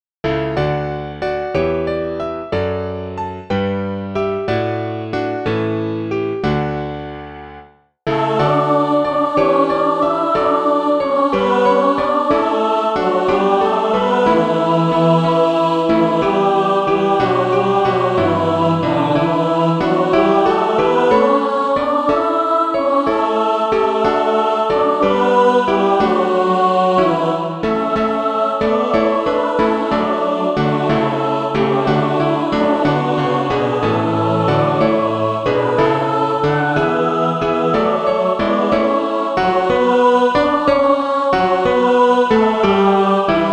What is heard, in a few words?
mixed